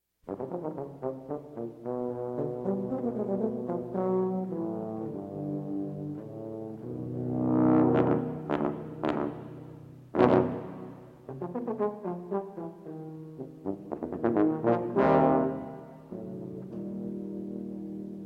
tubas lively

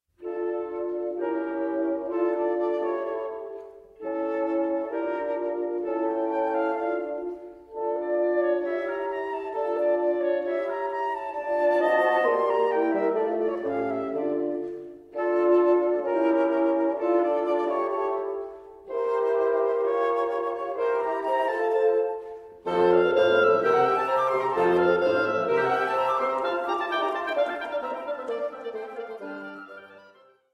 Quintet; in D Minor; Mvmt IV

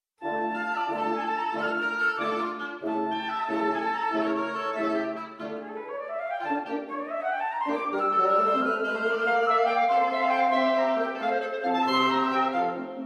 Quintet; in D Minor, op 88, no.4, Mvt 3

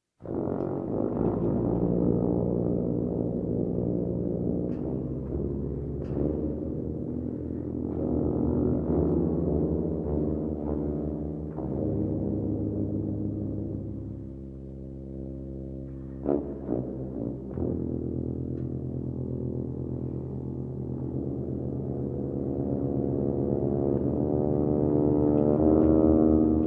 Tuba Quartet; Five Moods for Tuba Quartet; Mvmt IV

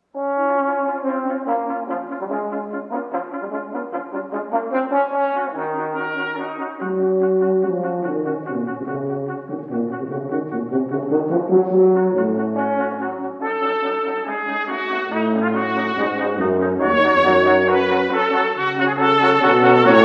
Brass Quintet

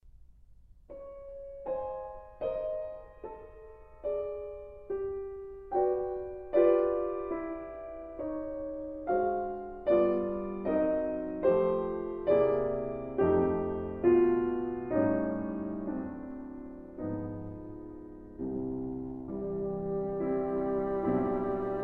Trio Andante; Francaix

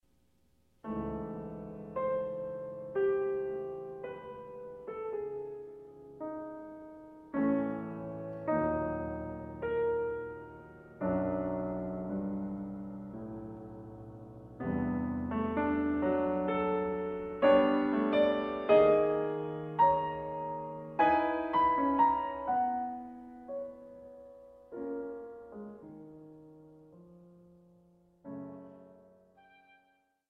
Trio Slow

